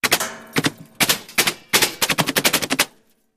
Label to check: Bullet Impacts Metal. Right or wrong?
Right